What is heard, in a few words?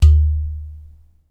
UDU, African